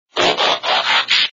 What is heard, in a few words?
Transform SFX